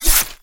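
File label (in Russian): резкий властный взмах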